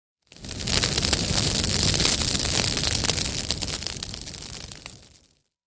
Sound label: Roar Crackly Brsh Fir, brush fire exterior